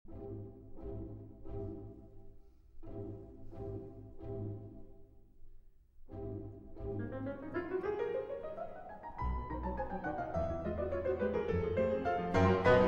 Piano Concerto in D-Flat Major, Allegro Scherzando; Prokofiev; Piano Concerto No. 1